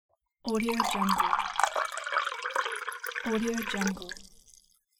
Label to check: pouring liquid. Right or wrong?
Right